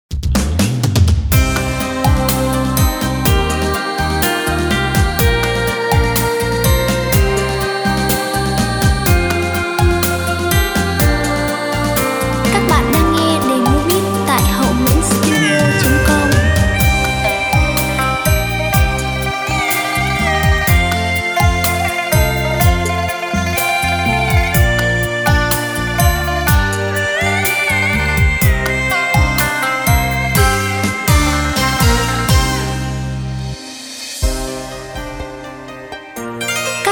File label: beat, playback